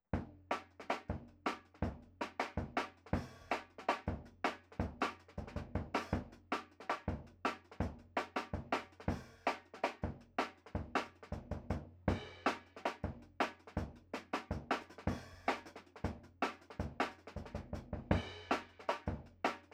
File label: TRASH ROOM, DRUM N BASS:JUNGLE BEATS; BLIND MAN RELAPSES INTO HARD BEATS TILL HE SEES THE LIGHT AGAIN; RAW MULTITRACKS